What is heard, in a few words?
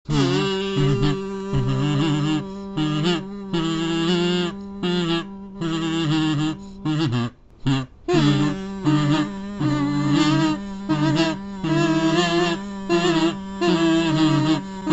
kazoo orchestra